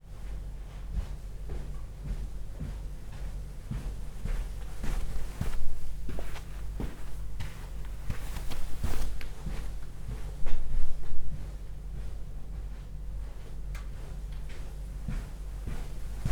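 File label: Noisy Footsteps Sneakers On Carpet Mono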